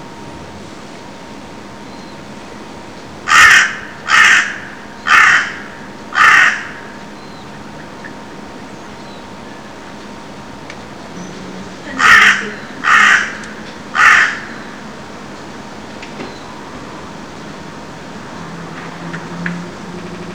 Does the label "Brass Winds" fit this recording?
No